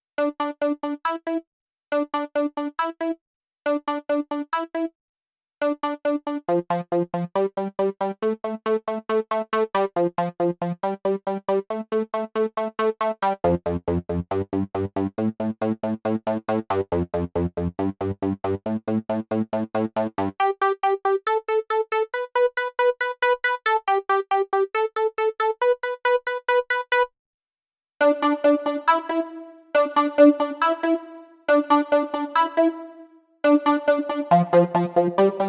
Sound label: Electropop; OXA